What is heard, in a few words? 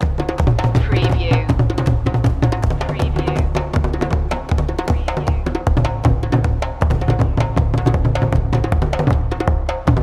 african drums lipango loop